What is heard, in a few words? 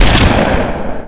SPAS15; SPECOPS